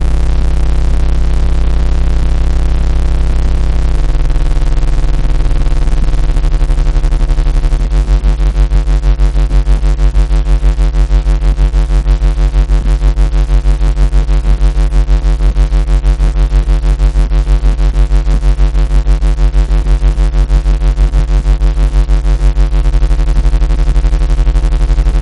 bajo

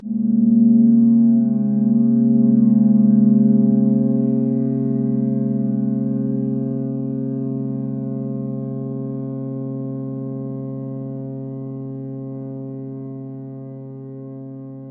zumbido

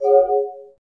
seleccion; ps2

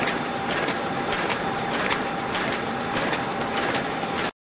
industriales

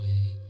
golpearon

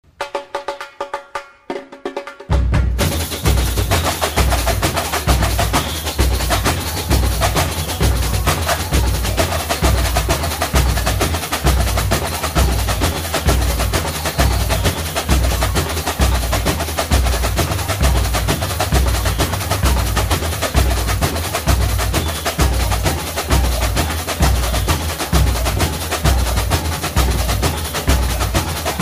batucada

